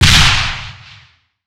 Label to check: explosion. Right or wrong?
Right